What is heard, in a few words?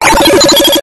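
science fiction console computer warning beep; zapsplat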